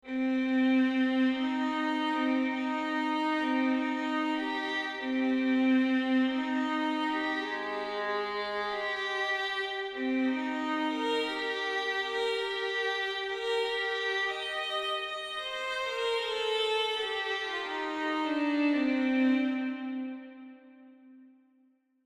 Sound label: strings violin vibr; captains strings